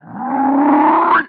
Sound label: DIE